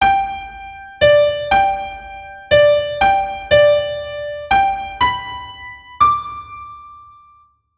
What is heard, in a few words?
eine kleine just melody